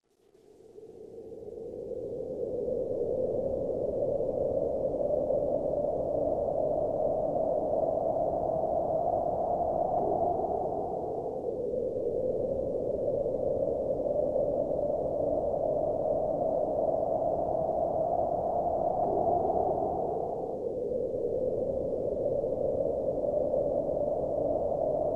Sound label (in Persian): Baad; Vocal&Effect